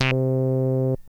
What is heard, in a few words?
Synth hits and riffs